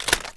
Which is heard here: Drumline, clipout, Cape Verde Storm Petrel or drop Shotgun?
clipout